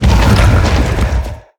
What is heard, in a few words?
roll base; smite; god geb skin7